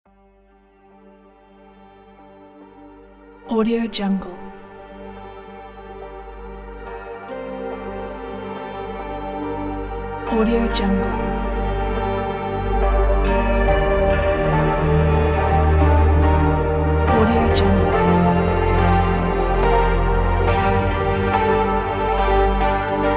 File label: space beautiful logo